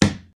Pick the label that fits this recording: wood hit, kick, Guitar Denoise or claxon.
wood hit